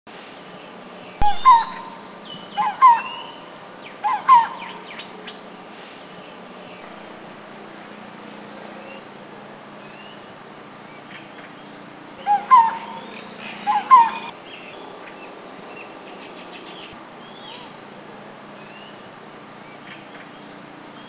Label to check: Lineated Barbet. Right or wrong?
Right